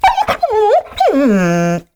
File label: Kubrow Tame Loot Fail E; Warframe